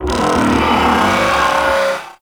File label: Step Movement; Enemies; Steps; Warframe; Corpus; Arachnoid Camper